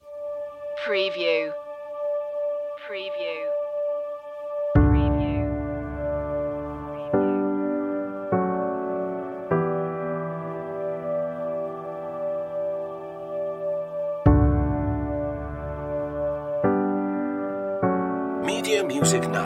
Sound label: will it rain less guitar